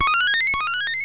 1up; Megaman

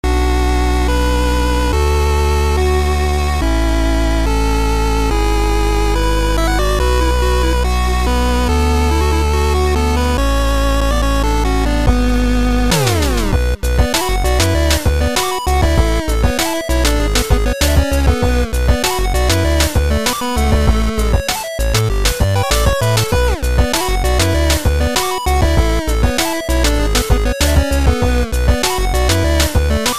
8 bit

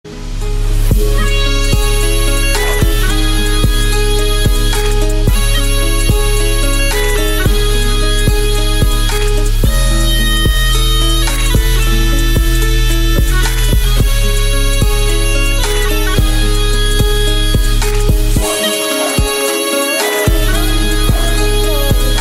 Turkish Trap

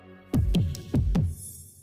Audi Jingle

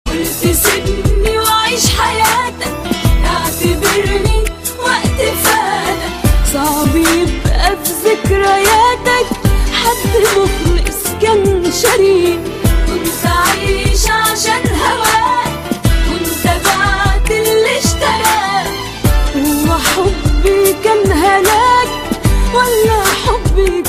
Romantic Tone

